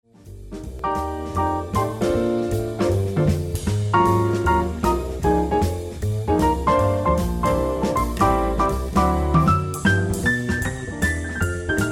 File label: Jazz Club